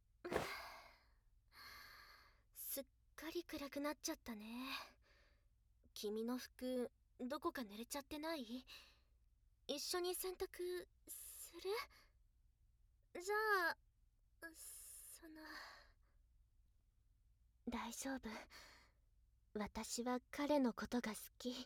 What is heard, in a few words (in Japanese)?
密着対面座位; 甘々本音remix